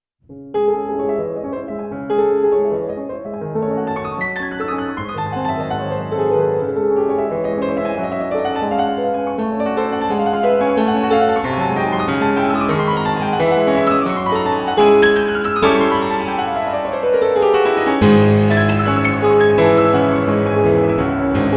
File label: bluethner expressional; chopin